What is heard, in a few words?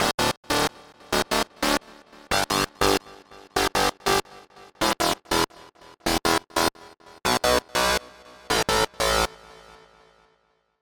lead; AIR Vacuum Pro